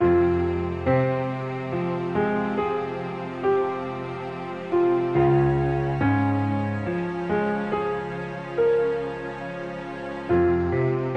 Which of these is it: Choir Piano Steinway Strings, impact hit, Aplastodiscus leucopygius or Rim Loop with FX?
Choir Piano Steinway Strings